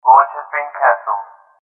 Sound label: silo launch abort; Dystopia